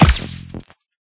Greypistol Shoot

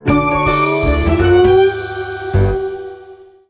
Section Complete; Run Roo Run

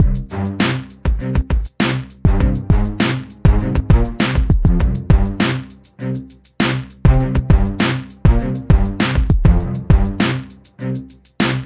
Hip Hop Theme; StreetKix Freestyle; PSP

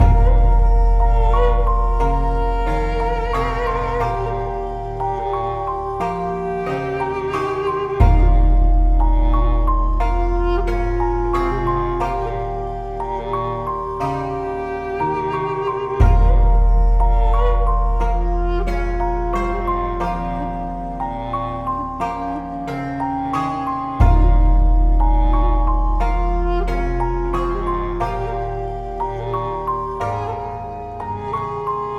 Home Finally Calm Loop 60bpm 8bar; project zomboid

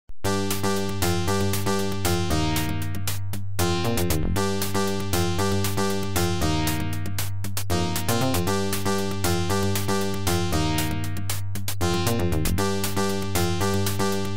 Stage Music; Dyger

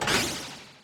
Throw; Super Mombo Quest

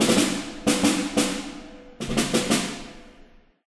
Quest Snare; gamerip; endless hell

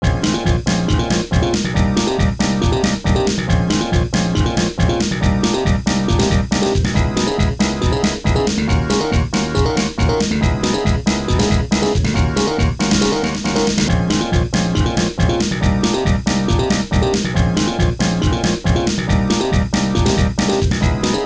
Continue; The Outfoxies

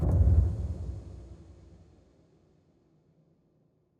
Gate Full Open Stop

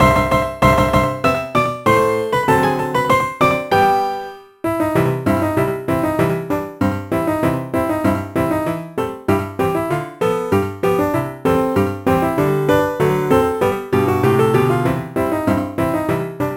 BGM PIANO ALL

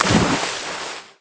Die water

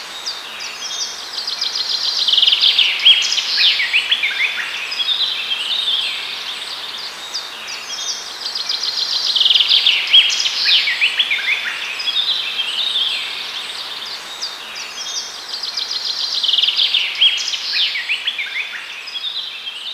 Ambience - Kelp Dome

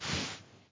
Cloth

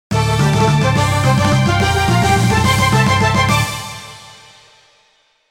Fanfare

